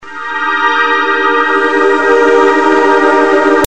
Hardcor

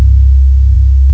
Bass

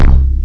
Bass

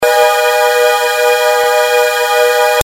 Chord

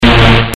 Ravey

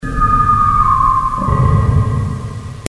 Undwat

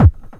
Bdrum

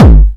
Bdrum

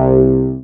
moog, bass

